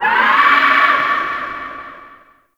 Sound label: Distant Creature Shriek; Ambience; Warframe; The New War; Narmer Outpost